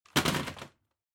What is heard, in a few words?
Vacuum Cleaner Knock Over